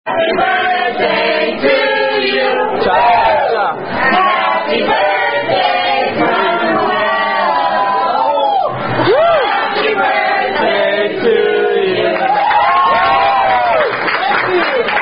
Happy Birthday (Standard) With Cha-Cha-Cha By Wait Staff; Chili's